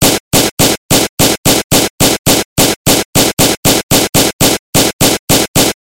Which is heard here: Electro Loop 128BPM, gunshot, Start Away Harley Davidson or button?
gunshot